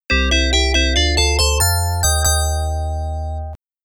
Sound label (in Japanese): イベント効果音; Kigen Kagayaki no Hasha; PC-9801